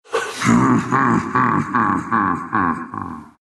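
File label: laugh, Russian; Dota 2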